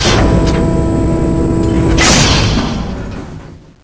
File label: big door; dm criticality